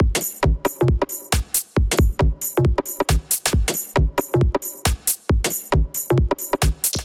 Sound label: Rollin Breaks Kit 136 bpm; MIDI Clips; Drums; Ableton; Factory Packs; Skitter and Step